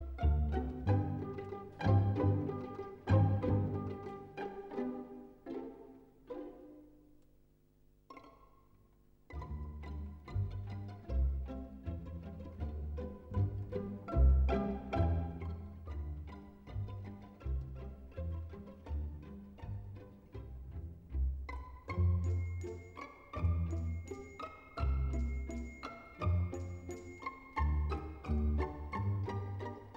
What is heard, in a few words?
Pizzicato polka; Strauss